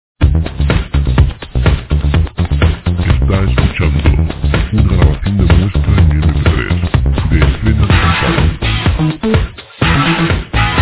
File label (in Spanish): cristales dance